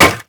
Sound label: wood plank impact hard; physics